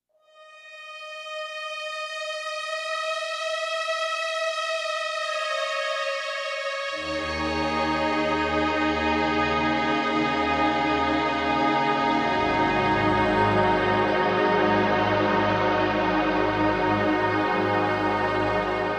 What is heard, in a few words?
Big Chords; Beds